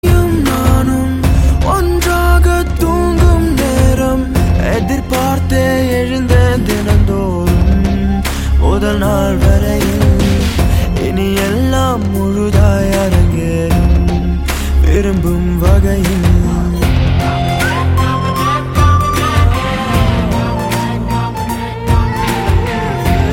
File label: Male Ringtone